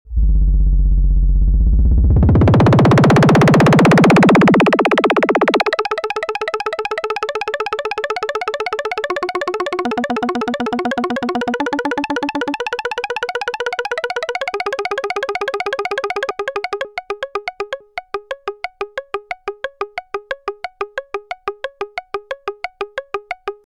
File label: piano roll